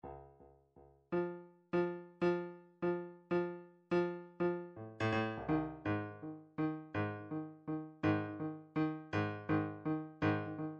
odd meters; Drumtrax Library 2

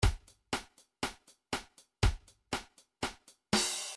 reggae 2bar

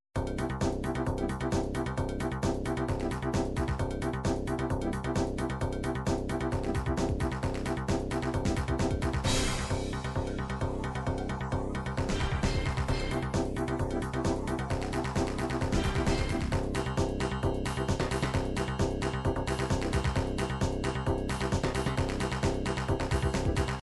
dance pop, midi

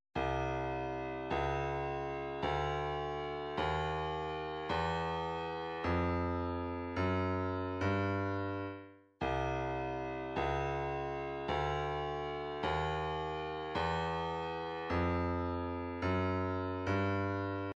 130 snare

